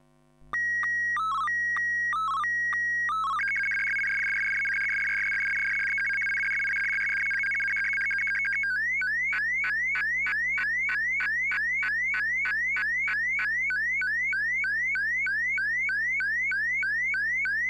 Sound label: ATV125BW, SSTV Signals; hamradio; AVT